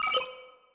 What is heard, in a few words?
viewscreen on; ebridge